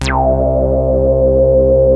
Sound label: ODY, ARP Odyssey